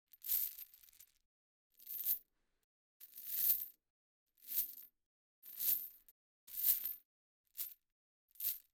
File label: Dog Chain Hits, Metal Chains; Conor Bradley